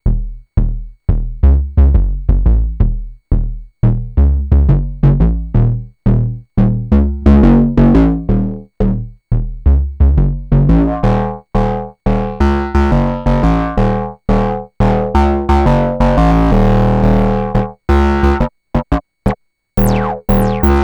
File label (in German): monologue sequenz